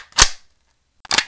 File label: ak reload